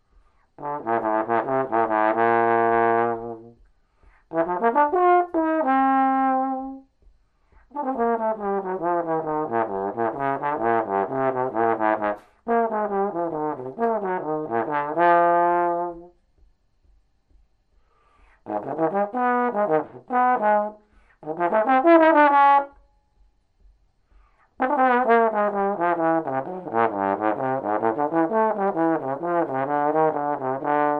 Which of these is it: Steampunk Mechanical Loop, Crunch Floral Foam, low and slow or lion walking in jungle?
low and slow